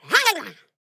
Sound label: Otter attack